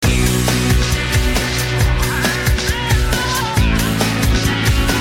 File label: Game Tune